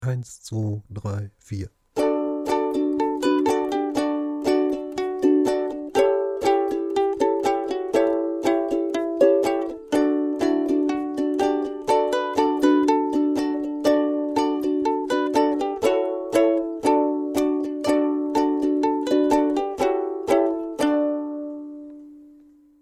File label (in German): Lasst uns froh und munter sein, ukulele